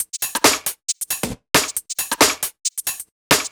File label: Beat, 136bpm, uk garage